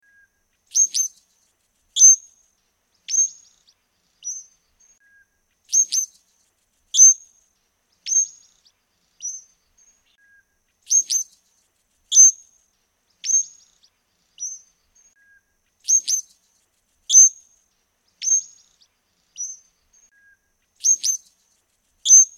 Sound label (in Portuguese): Canto do turquoisine